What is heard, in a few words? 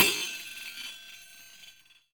ZEMBONDT, PERC; NorthStar - The Wizard VOL-2; TSAR PERC